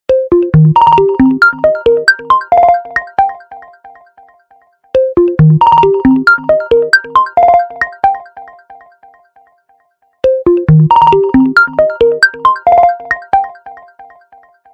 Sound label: Rhythmic Notes, ringtones; Itel; A04